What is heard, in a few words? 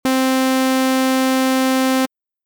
Saw